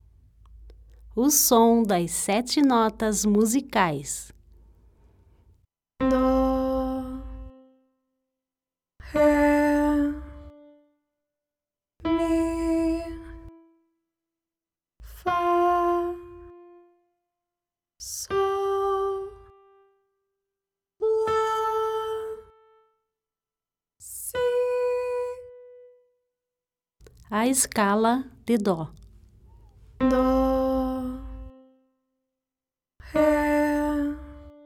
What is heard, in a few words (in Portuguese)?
O SOM DAS 7 NOTAS MUSICAIS